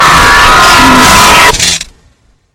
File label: jumpscare sound; week in the laboratory